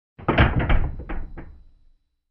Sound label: Truck Back door close slam